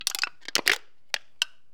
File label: soda can twist; soundscrate